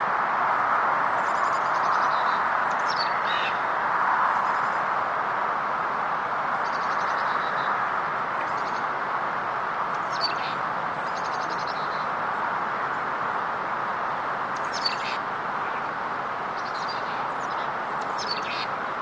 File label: bird